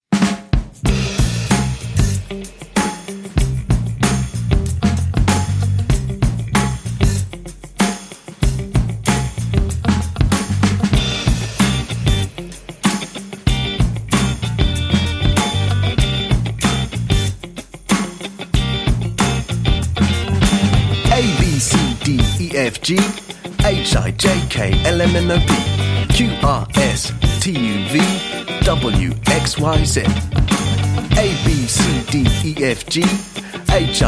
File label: Recycle Funky Alphabet